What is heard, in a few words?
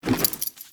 Foley Idle Short; Ambience; Gameplay; Teshin; Warframe; Duviri